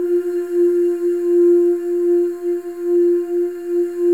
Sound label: FEM OOS, Symphony of Voices; Spectrasonics; FEM.2 OOS